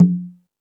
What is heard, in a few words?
Jungel Percussion, E-MU Pro-Cussion